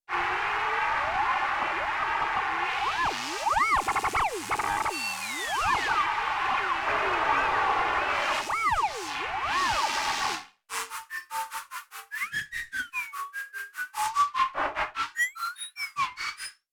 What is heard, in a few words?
Old Radio, Korg Radias-R